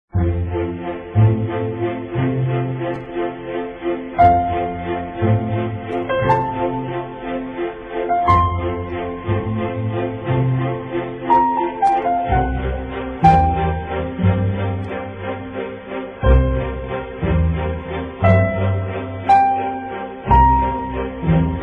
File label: Piano Concerto Mozart